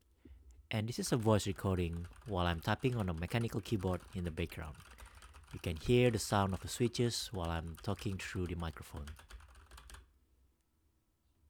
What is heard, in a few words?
XCM with mechanical keyboard Recording